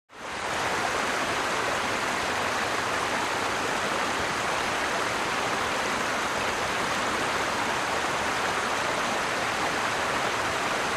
River - Fast Moving; rivers

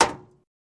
Hammer Hit Auto Bump; hammers

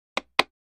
Cards Deck Taps, card games; casino games

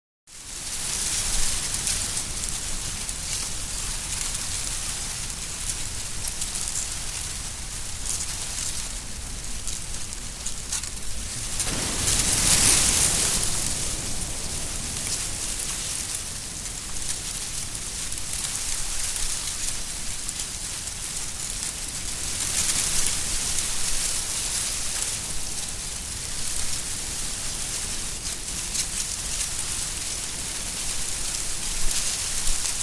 Cornfield Rustles In Light Wind

